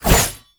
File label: Kunai Whoosh Sweetener; Weapons